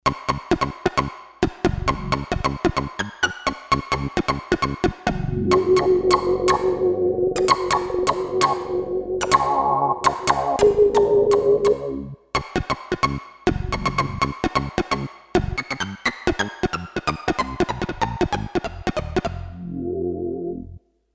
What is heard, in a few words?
Plinky